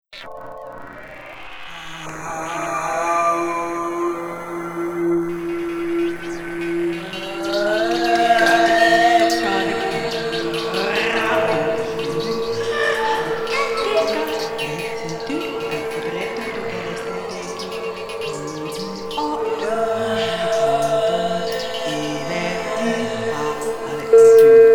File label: Aphasia Wont Speak for Voice Speech Phonetics Improvisation